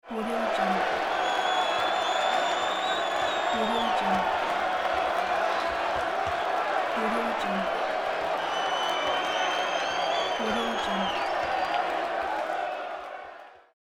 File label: sports game crowd cheering